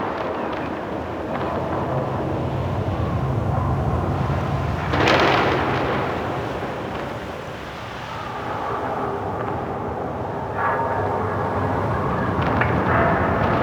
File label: Fortress Exterior Amb; Warframe; Ambience; Grineer Fortress